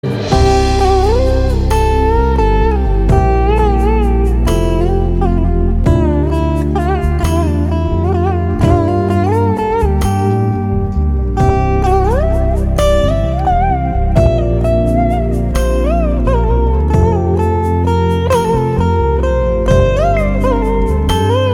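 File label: Love background music Tone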